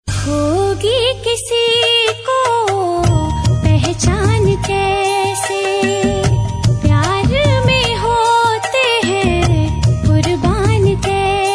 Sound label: Very Sad